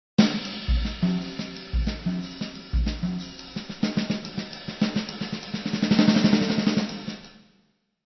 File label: fills jazz